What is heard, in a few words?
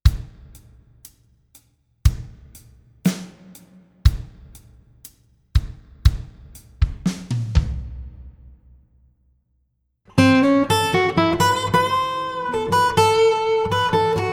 Hall B3